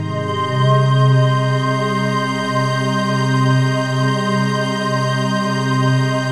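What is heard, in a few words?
trance pad; Best Service; XX Large - Pads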